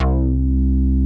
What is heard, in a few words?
Prophet VS BASS; Greytsounds VOL-1